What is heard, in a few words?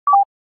beep; Kerbal Space Program; Chatterer; beeps